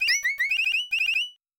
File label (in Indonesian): Nada Dering Nokia Jadul; Urgency